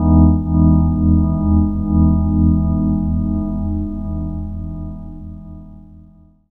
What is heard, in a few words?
Solar Chord; M8